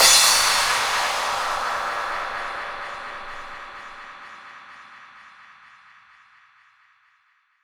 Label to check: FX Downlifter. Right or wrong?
Right